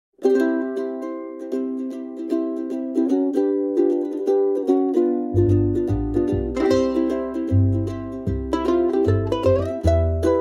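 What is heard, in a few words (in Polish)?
Muzyka Hawajska; Mele Hawaii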